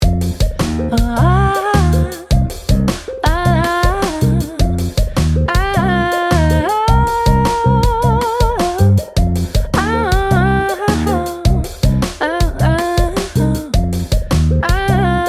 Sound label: hdelay; waves